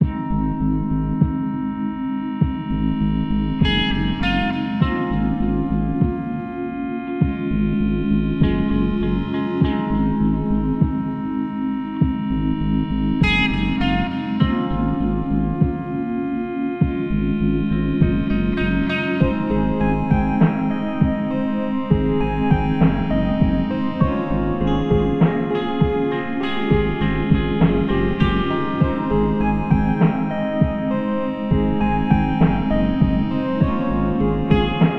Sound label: LOFI AMBIENT